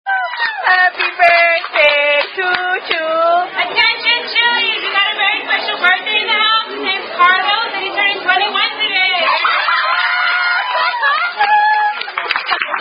Happy Birthday (Standard) Sung By Chili's Wait Staff